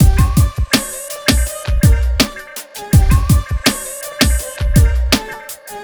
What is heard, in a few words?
RnB; Google; NewWave Labs